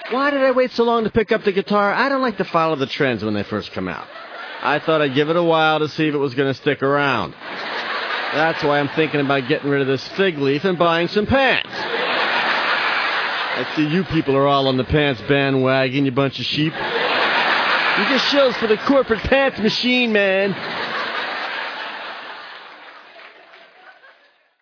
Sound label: Guitar